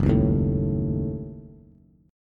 Gb-Minor 6th, Bass; strummed; JGuitar

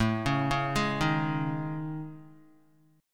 A Major 7th Flat 5th, Guitar, chord; AM7b5 arpeggiated; JGuitar

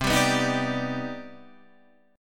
C Minor Major 9th C

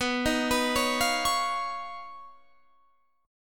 Eb 7th Suspended 2nd Sharp 5th, B

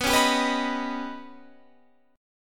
D sharp Augmented 7th B, guitar

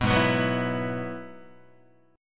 G sharp Minor 7th Sharp 5th G sharp down Guitar; G#m7#5 strummed; 2,4,2,4,3,3; JGuitar

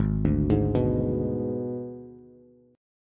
Ab Diminished Ab Bass; Abdim arpeggiated; JGuitar

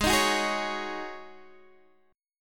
Ab 7th Suspended 4th Ab down Guitar DADGAD

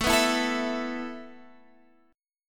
A Suspended 2nd A Guitar Half Step Down

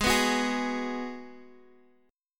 Ab Minor 7th, Guitar, Half Step Down

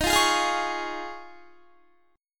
Eb 7th Suspended 2nd Suspended 4th Eb, Guitar; Eb7sus2sus4; strummed

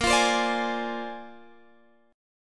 Bb 9th Bb down Guitar Open G

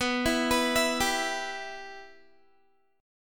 B Suspended 4th Sharp 5th B Guitar; Bsus4#5; arpeggiated; 19,19,21,21,19,x

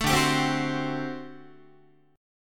D 11th D, down, Guitar; D11 strummed; tuning 4A,4E,5A,5D,5G#,6C#

